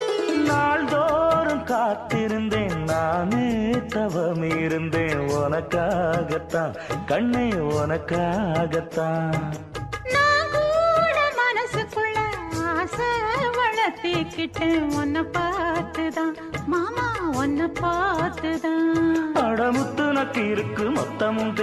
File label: 90s Tamil Song